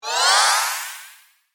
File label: Electronic Activation, Science Fiction